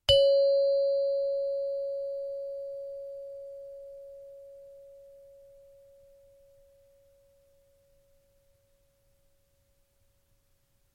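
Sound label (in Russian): звонок низкий C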